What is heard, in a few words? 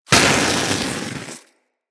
Smithy Forge Fire Coal Burst; Soul Plane